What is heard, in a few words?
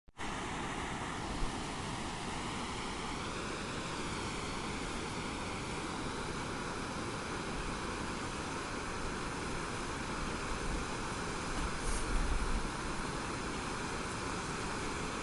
franz josef glacier viewpoint